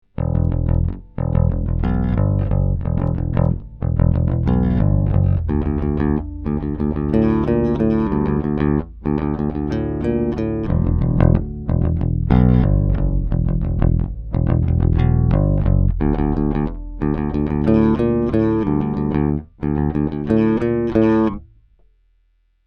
Bass; Apollo

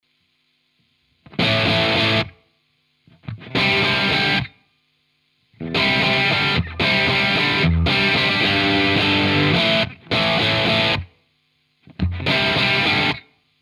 Plexi Tele

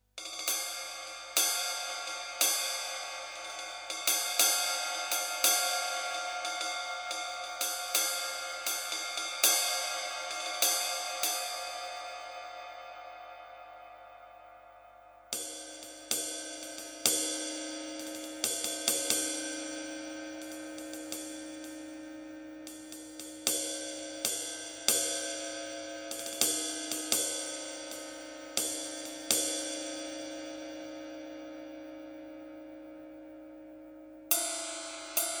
Ride Cymbals

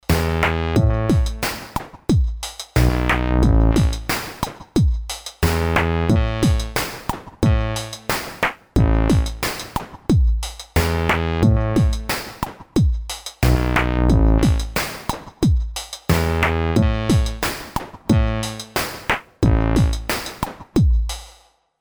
Artiphon ORBA 2 Drums Bass

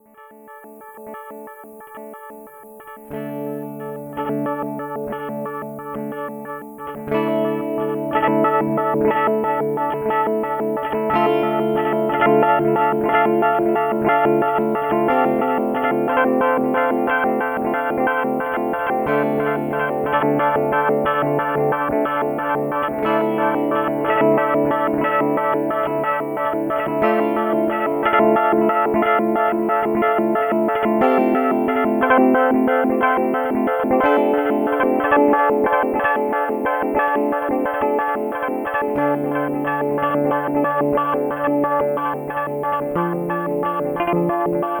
Alexander Pedals Radical Delay Arp

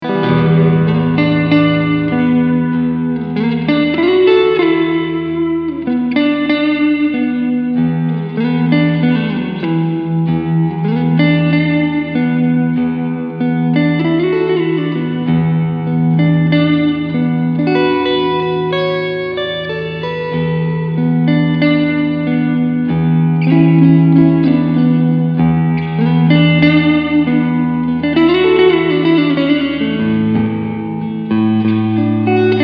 Medium Reverb Clean

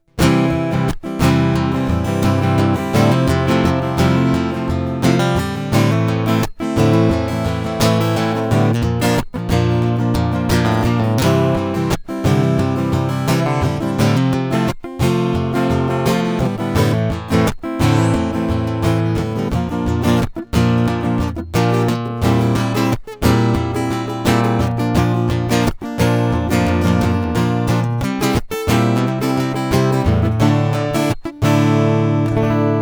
Schecter C 1 EA Classic SVPB Piezo Strumming

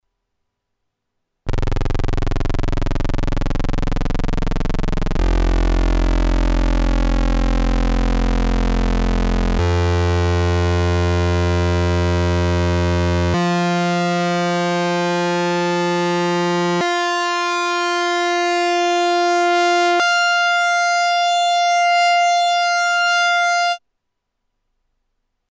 Korg Volca Keys Unison

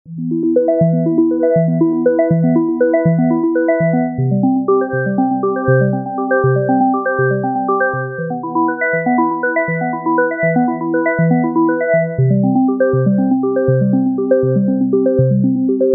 Omni2 ring

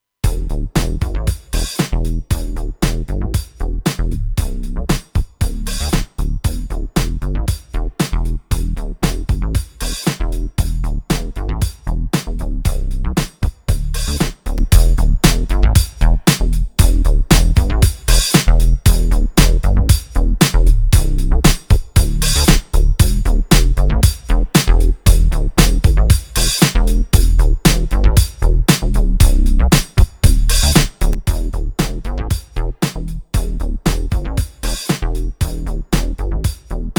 Loop Heritage Audio HA-81A Elite On Off

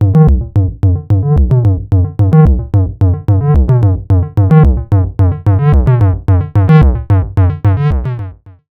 Knobula Kickain Stereo BassSequence

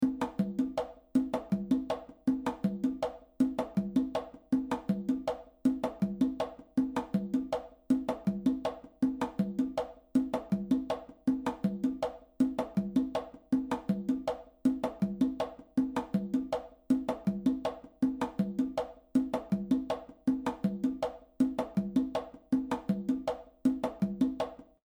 reverb loop Small Room Drum Booth; Space Designer

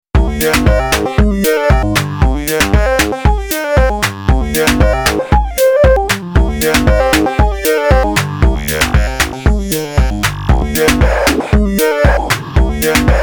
PO35 Voice mode jam

